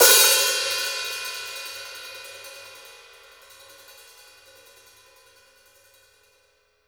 open ring, hi-hats; Sampleheads; New York City Drumworks VOL-1

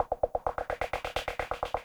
drums, Hardcore Breakbeat 129; Big Fish Audio; Hardcore Breakbeat Action; Core 129 Cmin

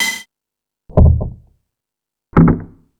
Tool Kit, Drum Machines E-MU Pro-Cussion

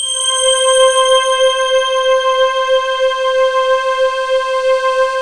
steam C5

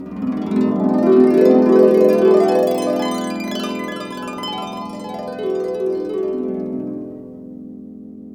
HRP GLISS; Roland - LA Composer vol. 1

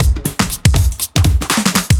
Trip Swing Mix B 120; off the grid

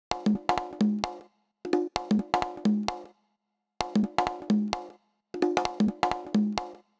Conga Loop 125; Equipped Music; Loungin House; Perc Loops 125

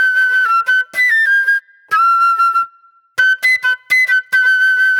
Flute

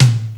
TOMTOM; Crypton - DrumBase5000; DRUMULATOR

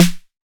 808 SD Tape Sat, Snare Drum; Tape808; Goldbaby Tape808 & Tape909

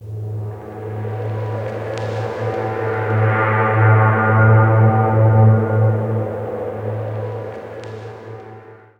Stone Drone; M8

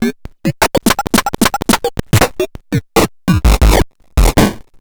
grind, EMU XL-7

